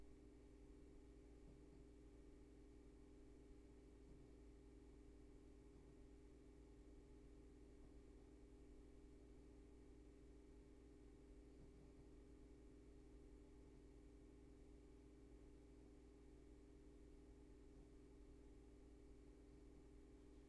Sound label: room tone